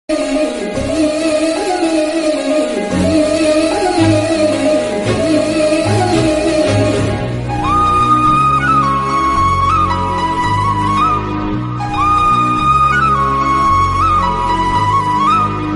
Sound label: heroine bgm music telugu movies background music; pandemkodi